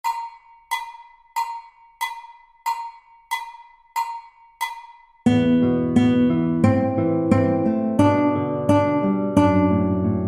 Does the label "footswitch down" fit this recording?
No